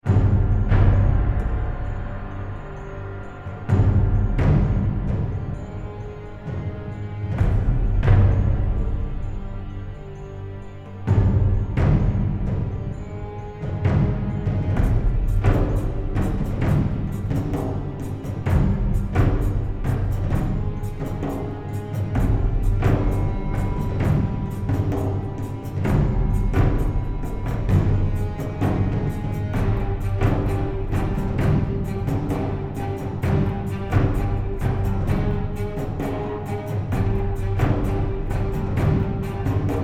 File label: Akhet Drums; Age of Conan Hyborian Adventures